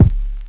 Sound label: kick dirty vinyl; Kicks; single hits